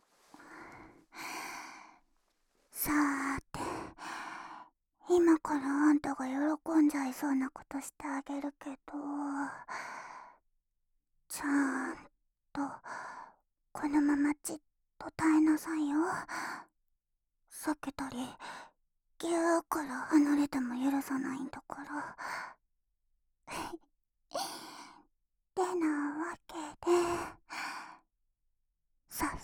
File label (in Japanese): 抱き合いながら耳舐めと囁き; 【1周年記念】耳舐め総集編